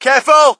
crowd, shout; Mike Tyson Heavyweight Boxing

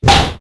baseball glass hit; projectiles; melee hit; Max Payne

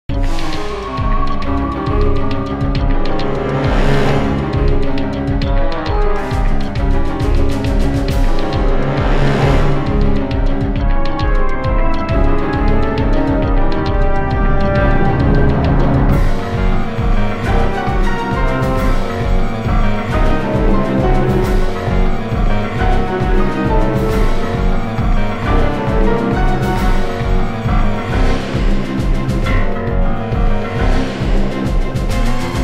Fight; Mansion; The Da Vinci Code; XBOX

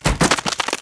deadfall stone, foley

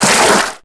water, footsteps; left; characters

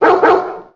DOGG; TrueCrimeStreetsOfLA; XBOX

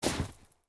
FS Snow, footsteps

